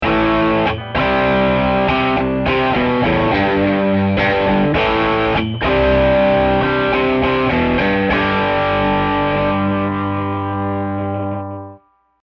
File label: Bridge OD Heavy